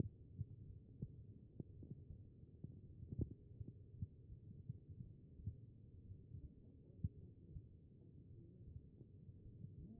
HEART pos4; B MODE

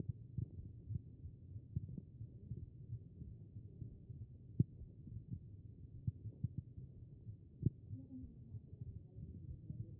HEART; B MODE

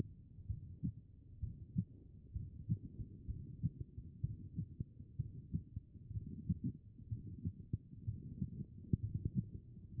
HEART; B MODE

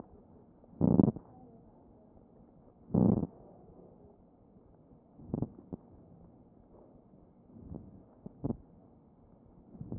CHEST pos1 D MODE

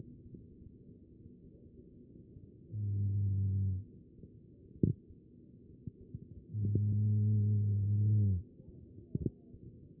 CHEST; B MODE

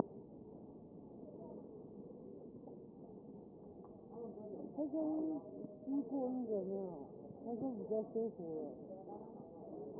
P CHEST

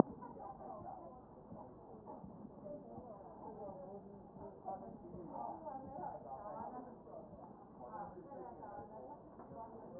chest

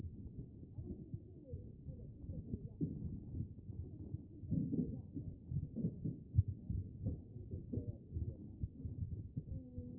A CHEST; B MODE

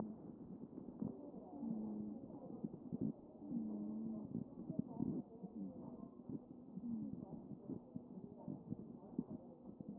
chest

